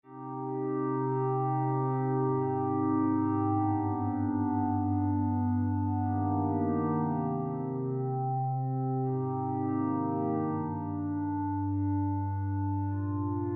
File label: atmos quasar pattern